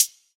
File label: short hihat sound f key